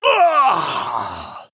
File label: Male Human Death